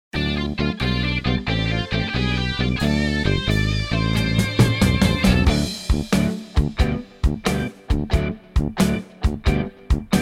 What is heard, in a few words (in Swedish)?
Enfingerblues bg 90